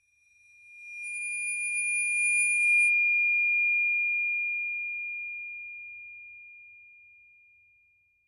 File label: Tibetan Chime Bow, chimes